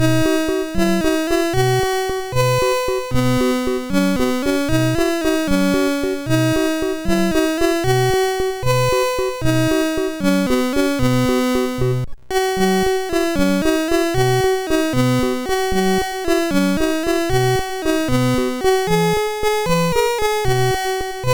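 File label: Reversi, MOS 6581 SID chip music, games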